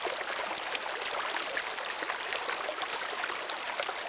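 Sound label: water flow loop; water splashes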